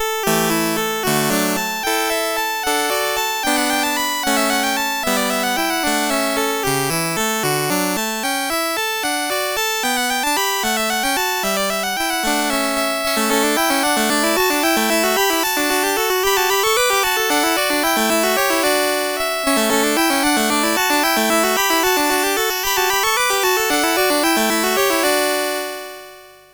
Passapied, MOS6581R4; Joseph A Grau; Les Petits Riens

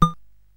War Cars Construction Kit, CSG8580R5

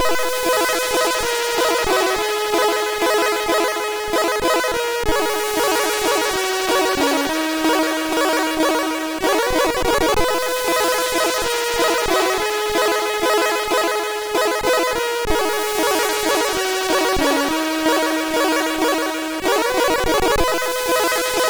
Melancholy, MOS6581R3

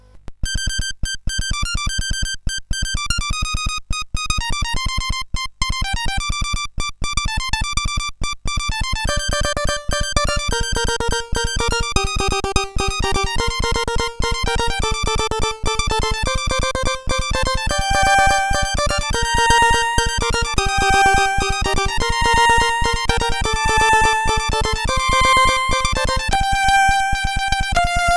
Loader sid CSG8580R5

